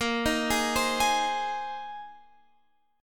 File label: Bb 7th Suspended 2nd Suspended 4th Bb down Guitar Drop D